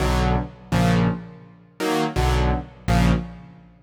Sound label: DEEPHOUSE ORGAN 125 A